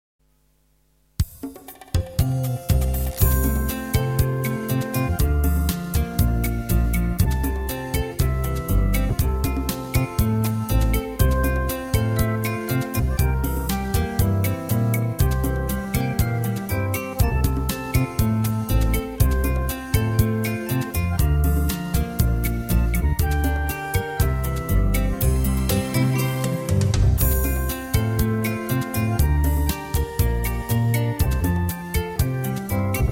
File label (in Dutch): karaoke